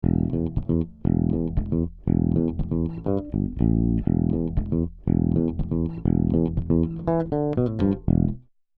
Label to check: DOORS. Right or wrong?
Wrong